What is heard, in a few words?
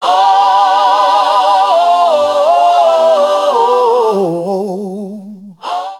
sting; fox s lobster house